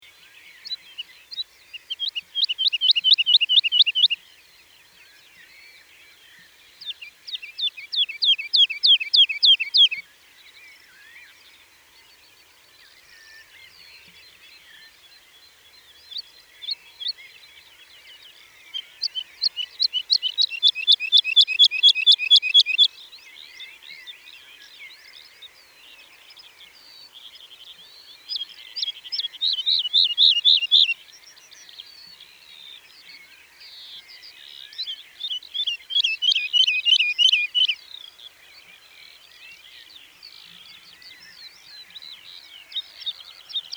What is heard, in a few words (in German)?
Heidelerche; Dittenheim; Michle Pron